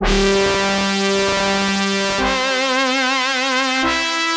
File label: Trumpet Like; Сэмпл синтезатора (RnB Soul); 110 BPM; Synth